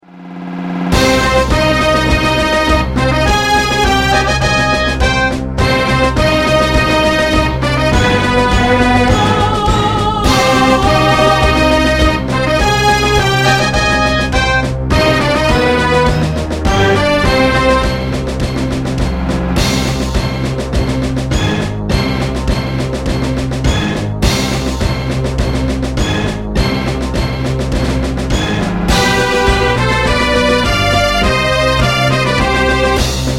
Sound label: character select ultimate gba soundfont mashup; jet force gemini